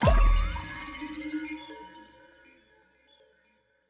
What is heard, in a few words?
ui menu button start game; Spacebase Startopia